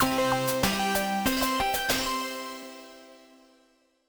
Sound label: level end; A Cat Game